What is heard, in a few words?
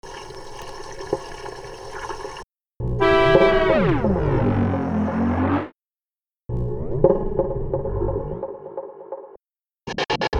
contact mic